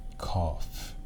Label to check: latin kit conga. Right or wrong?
Wrong